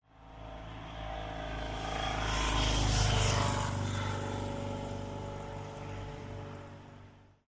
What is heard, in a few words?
Motorcycle